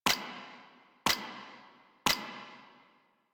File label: Weapon Timer; Warframe; Caliber Chicks minigame; pick ups